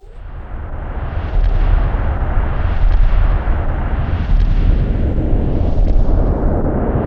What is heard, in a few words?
Machines; Dream Zone